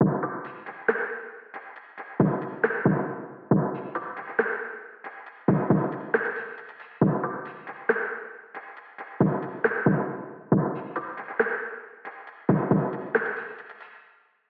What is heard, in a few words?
Reverbrated Drums